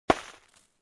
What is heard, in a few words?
Object dropping sound